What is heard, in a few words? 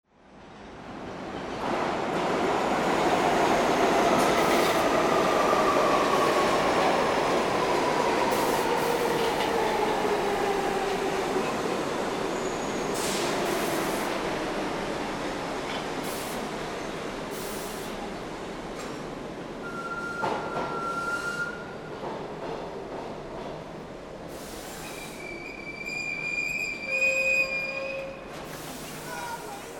TRAINS